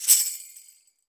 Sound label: Tambourine Free; Percussion